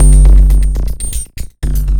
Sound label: Processed Beat, Drums & Percussion; Electrocution; Processed Beats